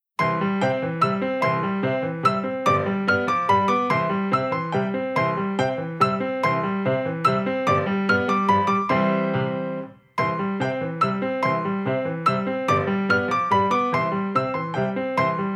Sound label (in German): Deutscher Tanz